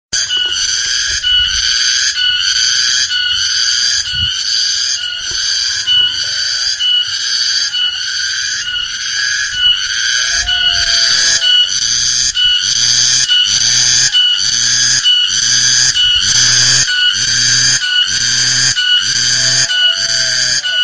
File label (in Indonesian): tonggeret